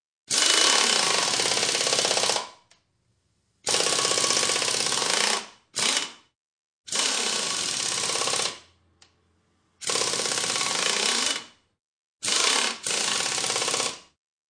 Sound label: Beeps; 헐리웃특수효과음; 신호-경적